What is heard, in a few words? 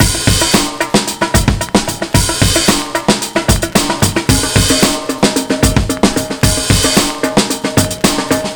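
drum, 112bpm, Breakbeat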